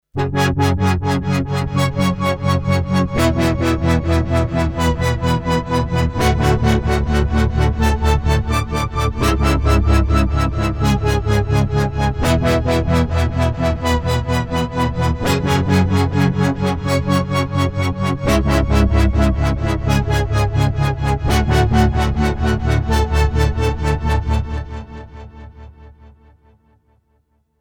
Korg DSS-1

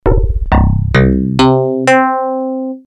dx21 funk bass; commodore amiga

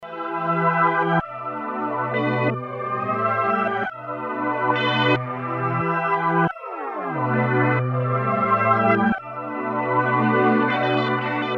synth voice, Roland JV880; voice reverse

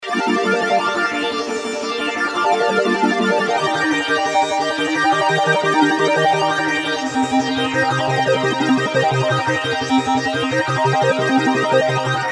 korg m1r synth

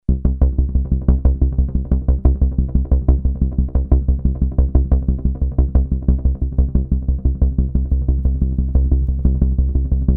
BASS analog, Roland JD800

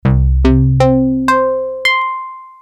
dx21 koto koto; commodore amiga

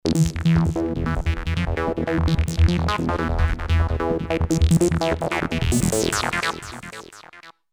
synth techno, Roland JV2080

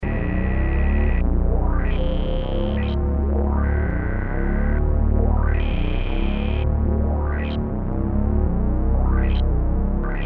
Fx motor, Kawai sx240

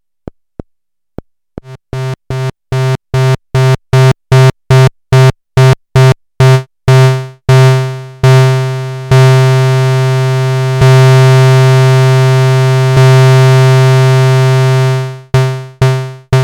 test eg, Moog minitaur

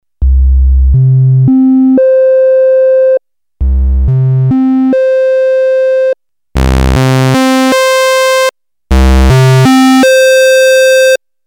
test waveforms, novation bass station 2

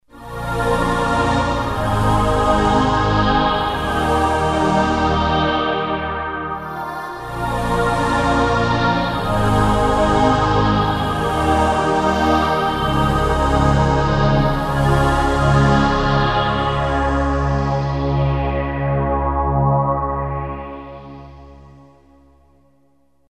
pad choir, yamaha sy99; synth

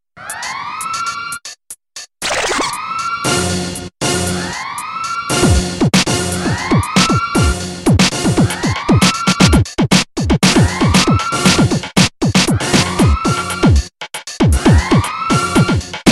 seq oldschool, akai s2000

